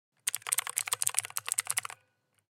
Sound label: blue switch typing